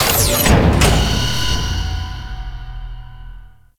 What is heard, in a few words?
Gas City Spy Vault Mach Close; Warframe; Corpus Gas City; Gameplay Remaster; Ambience